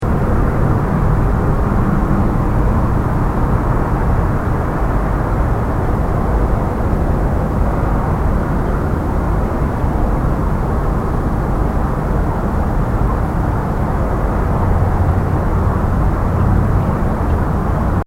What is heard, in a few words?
city